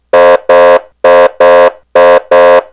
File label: horn